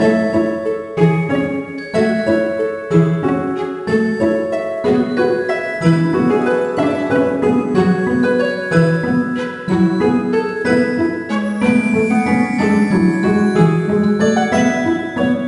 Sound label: Music Crafting; Littlest Pet Shop 3 Blue Team Pink Team Purple Team; NDS